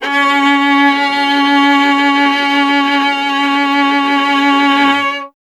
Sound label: viola C#; Miroslav Vitous; Solo Instruments VOL-1